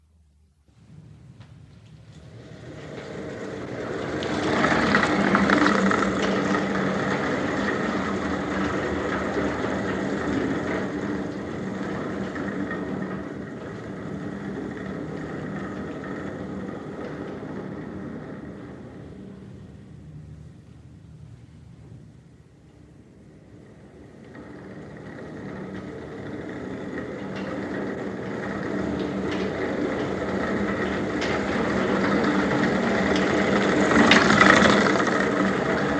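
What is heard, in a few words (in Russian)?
тележка катится по коридору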